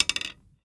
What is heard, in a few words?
Arrow Nock; timelock vr